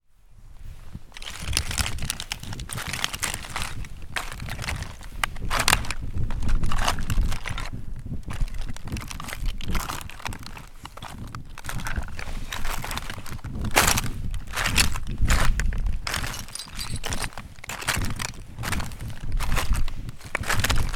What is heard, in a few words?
walking on kitchenmidden